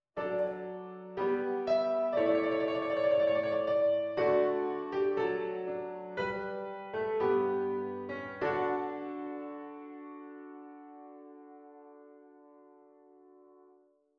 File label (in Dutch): vierstemmige zetting; slotwendingen